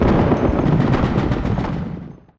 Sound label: scripted distant fog battle; Titanfall 2